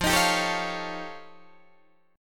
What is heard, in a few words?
F Augmented 9th F Guitar Open D, chord